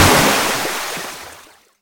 powerful water gush

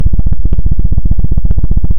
data loading pulse

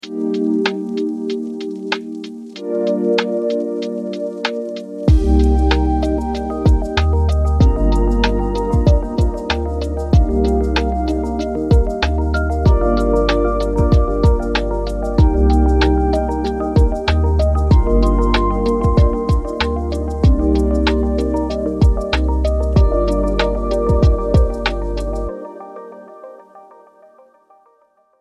dreamy sunset synth

